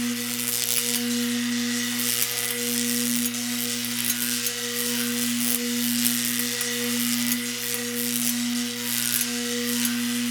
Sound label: loop; t6 fastfile mods; zm ai pack; ghost sounds